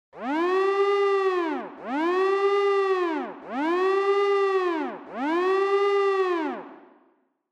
Warning Siren Fast Long

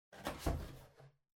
Cardboard Box Far Open; ダンボール; 遠い 開ける; 梱包材

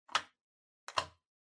Doorbell Mech Tone Button; ドアベル 機械式 トーン; ボタン

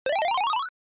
NES Action Item

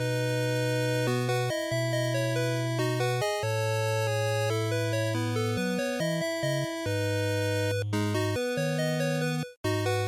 NES Shooter Ending Loop140

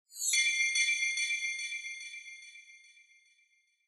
Bell Accent Delay

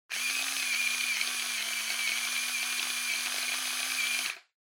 Spice Mill Salt

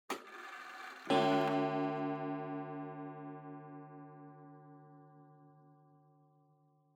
Pendulum Clock Chime Short

